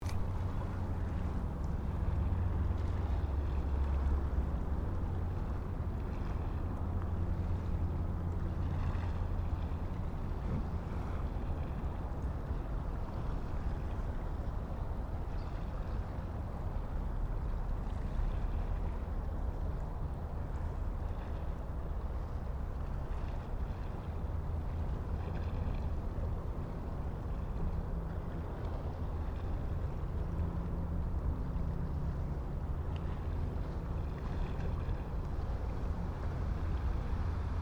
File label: Muromi River